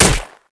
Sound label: Dmg Staff Cloth